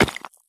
sprint tile; Escape from Tarkov

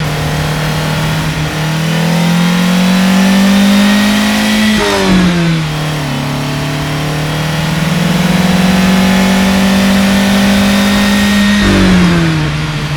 vehicle technical fast move loop

